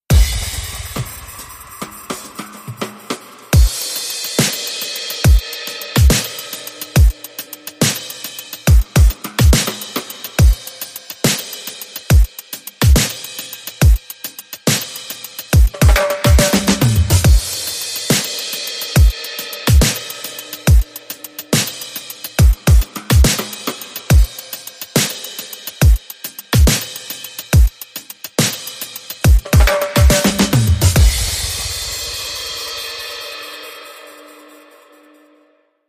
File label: Beat